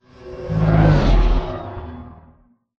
deploy from mega; Titanfall 2

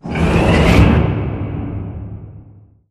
sliding door lg verb open slide; beacon; Titanfall 2

